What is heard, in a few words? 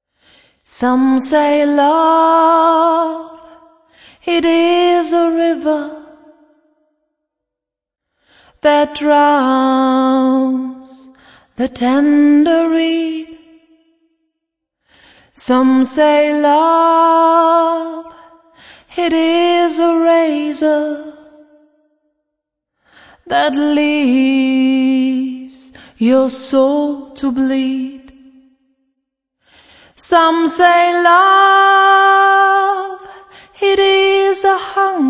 acapella; Sängerin